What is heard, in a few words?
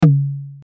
lo tom